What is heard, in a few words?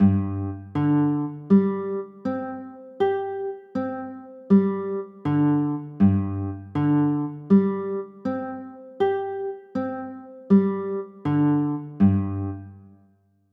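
G sus4 chord, guitar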